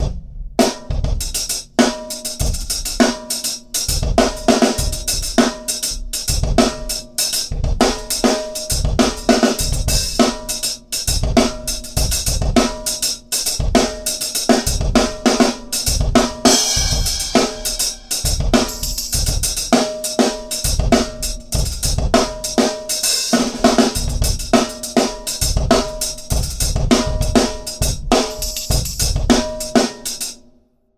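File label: subway play for tips playlist drums; The Sims 3; Windows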